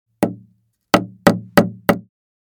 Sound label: Hammer hitting nail into wood hammering